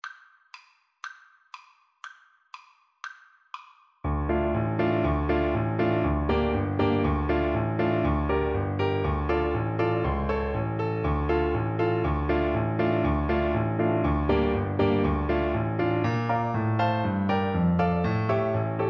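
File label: xylophone, Percussion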